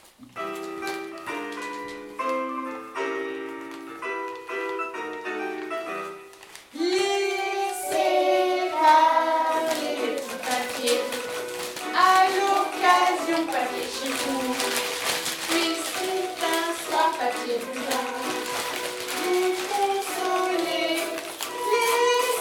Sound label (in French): instrumentalise